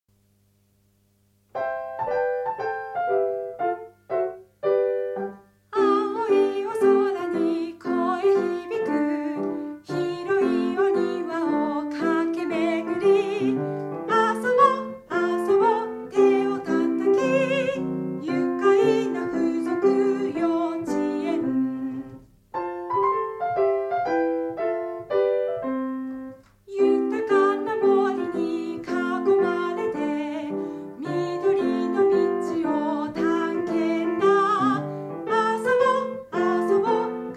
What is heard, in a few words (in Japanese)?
enka; kids